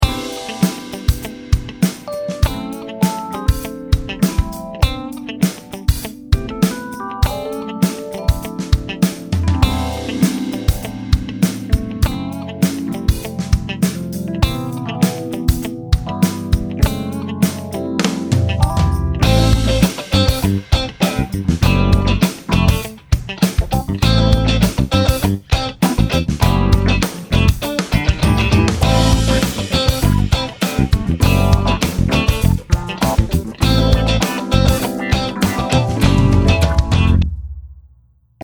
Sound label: Funk Solos Backing; SFE Brass